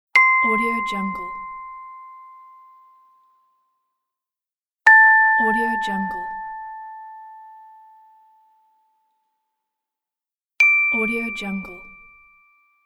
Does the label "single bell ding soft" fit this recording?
Yes